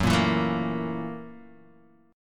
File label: Gb 7th Sharp 9th Gb Guitar DADGAD